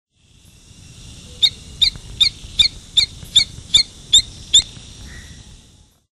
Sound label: Rhombophryne coronata